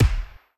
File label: Time Tik; Game rip; MiSide